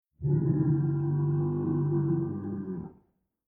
Nature Animal Beast Beef Bull Low Pitch Moan Growl Angry Eerie Monster